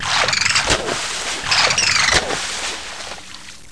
Dolphin; PC; MARIOTEACHESTYPING2

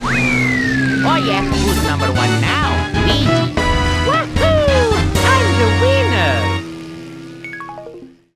finish grand prix; GAMECUBE; MKDD

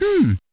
Hmmm; GBA

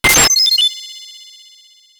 battle icebomb; SNES; SMRPG

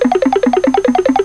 switch timer; GAMECUBE; SUPERMARIOSUNSHINE

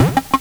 piggyback; DS; MARIO LUIGI PARTNERS IN TIME

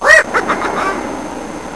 cackle; GAMECUBE; SUPERMARIOSUNSHINE

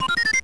Save; SNES; SMALLSTARS

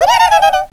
laugh; DS; ML BOWSERS INSIDE; Fawful

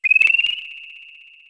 character power up; SNES; SMRPG

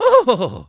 Huuhuhuhu; DS; NEWSMB; Last Koopa Demo

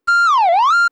sleep; SNES; mario; SMRPG

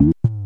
piranha Plant Spit Needlenose; N64; YOSHISISLAND

